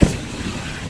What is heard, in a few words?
torch light; gmantowers